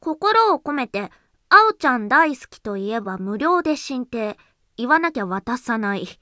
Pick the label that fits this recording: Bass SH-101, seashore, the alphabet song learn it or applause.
applause